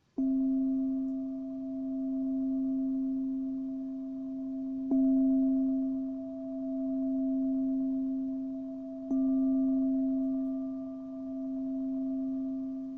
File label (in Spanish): 132hz, cuencos tibetanos